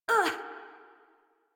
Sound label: Pain Adult; Warframe; New War; Adult Operator